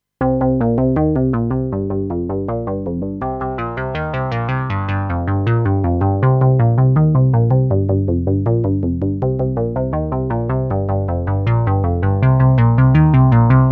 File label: Bass